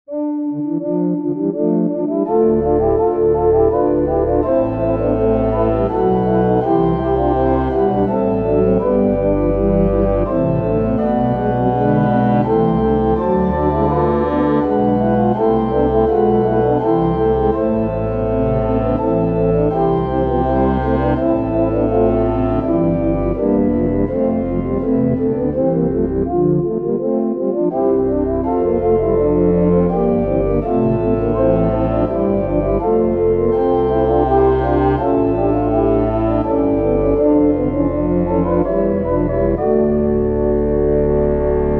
prelude for pipe organ; Johann Sebastian Bach; erschienen ist der herrliche tag

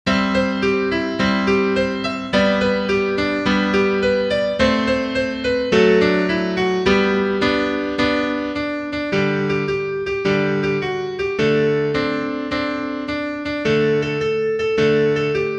karaoke

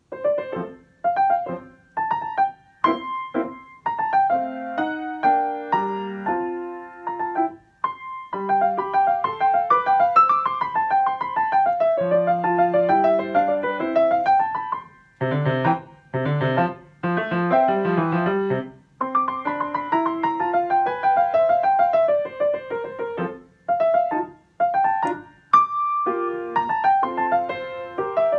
allegro; hummel johann nepomuk

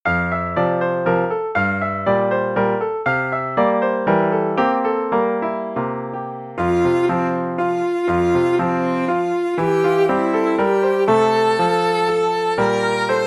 for voice violin and piano; beay erica the chimes christmas eve

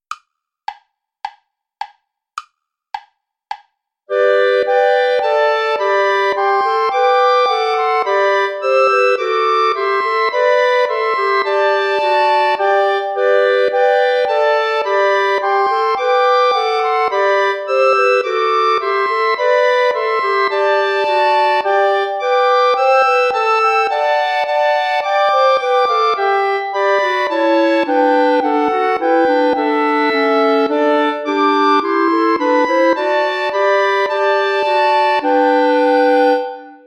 play along clarinet; bach johann sebastian; was gott tut das ist wohlgetan

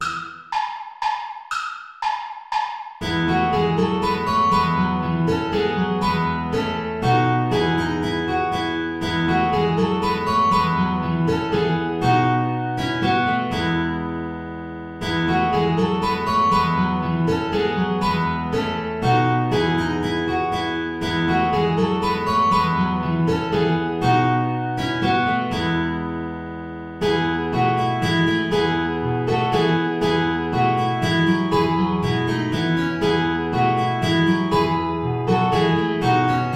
saltarello play along without guitar

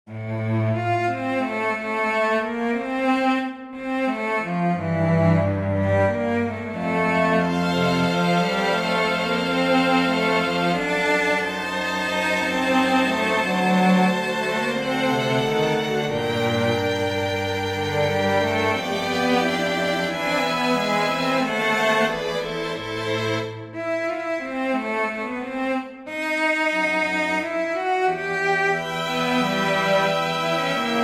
recitative for cello strings; bach johann sebastian; und kam seinen ngern

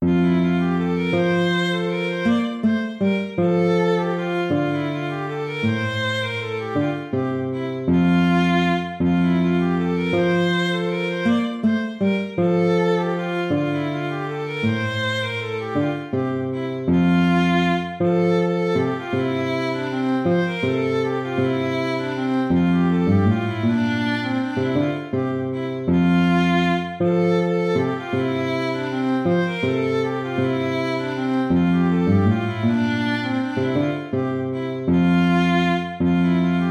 piece for lute

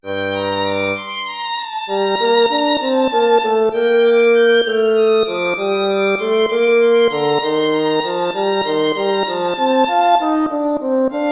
gigue

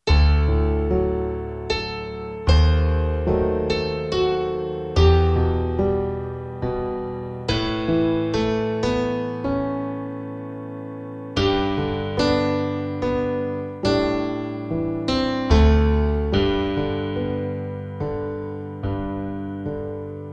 piano; Silent Night

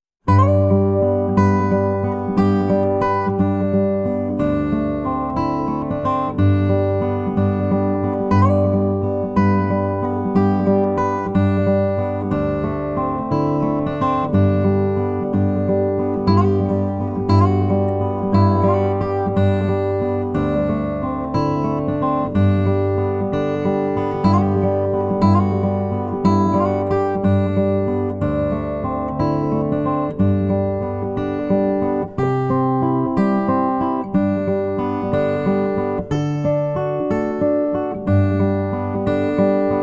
spanish fandango

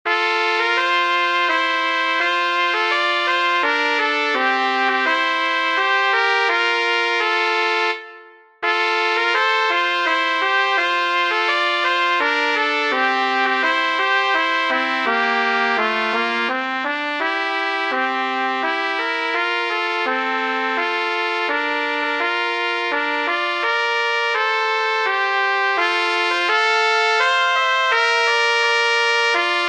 polish national anthem

